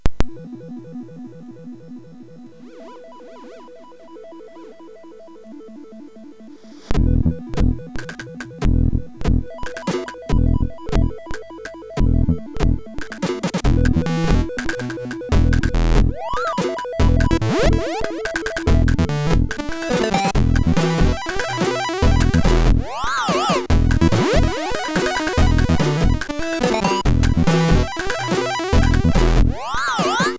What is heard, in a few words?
City Noises, sid MOS6581R4